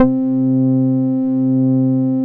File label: Dance Floor Bass, Multisample Basses